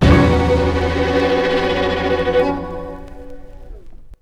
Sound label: intro music; Necronomicon: Book of Dead Names Online